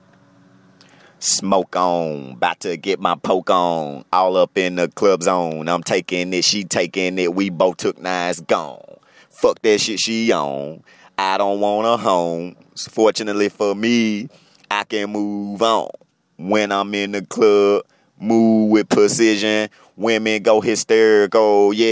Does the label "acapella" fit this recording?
Yes